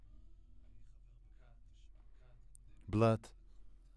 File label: Voc OD